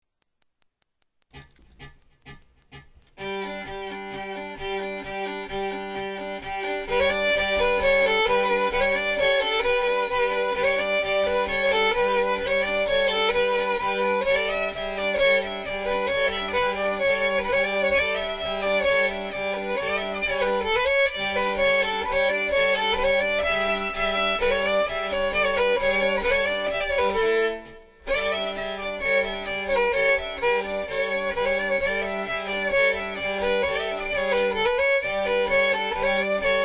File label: bagpipes